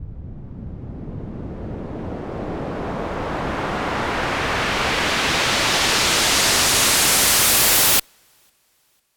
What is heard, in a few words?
Uplifter; FX; 99Sounds; Monosounds